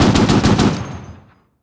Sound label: mechanized units mg fire; Hearts of Iron IV Death or Dishonor